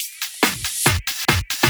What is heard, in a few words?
Loops Fills; Vengeance Samples